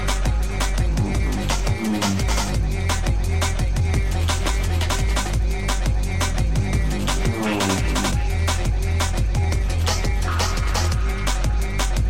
battery

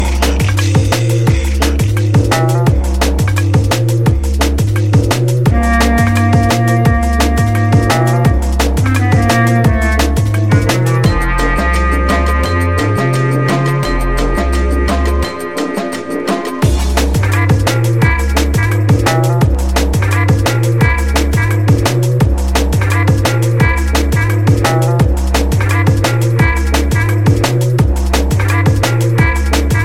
electro soul

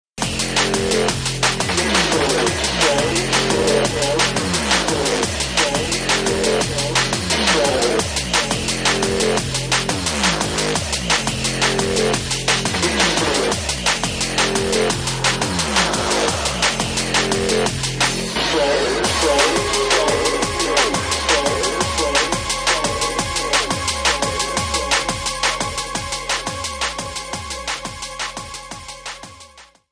Drum & Bass

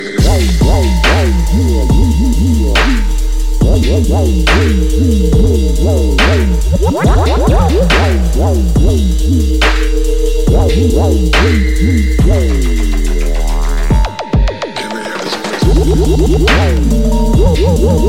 jazz

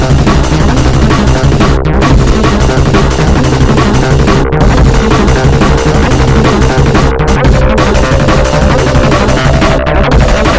Acid Jungle

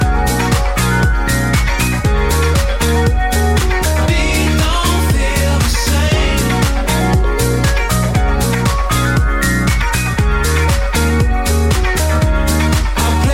high beat

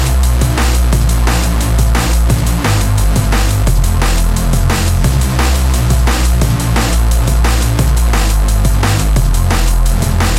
Drum & Bass

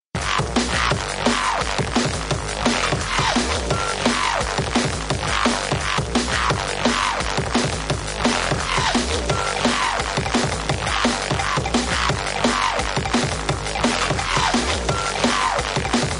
Drum & Bass

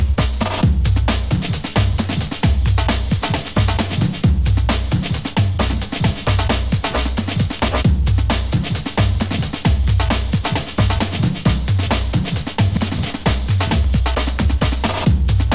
drum

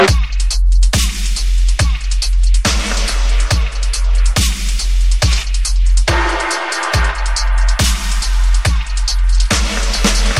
Dubbing

